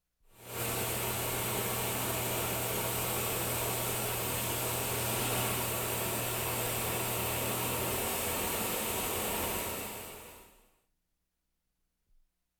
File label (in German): gasbrennerflamme; Geraeusche